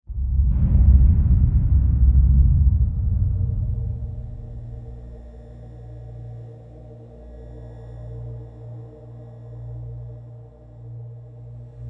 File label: Dark Scary Explore Mood